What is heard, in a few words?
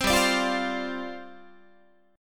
G 7th Suspended 4th G down Guitar